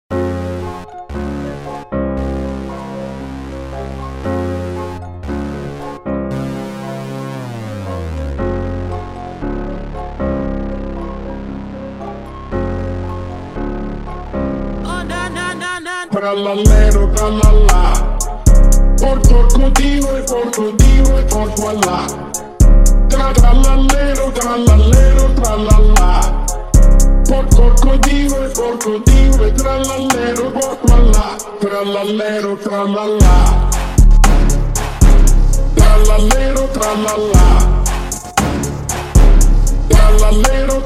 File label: slowed Trap